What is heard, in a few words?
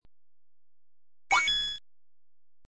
coin